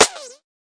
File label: tight hit, Tight n Hard Kit; Hip Hop; style kits